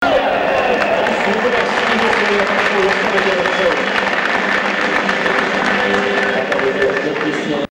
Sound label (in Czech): potlesk